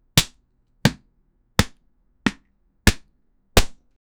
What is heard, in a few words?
the sound of a soft, breaking